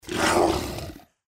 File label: lizard creature snarl